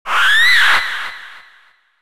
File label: Retro Scream